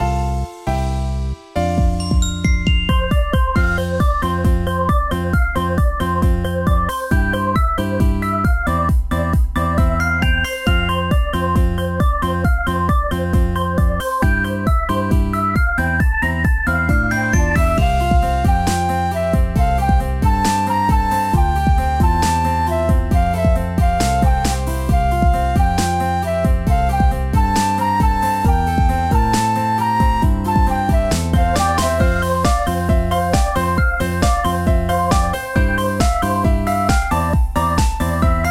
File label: sound bgm yatai; Chronus App Inc; Android